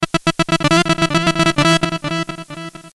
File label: outro notification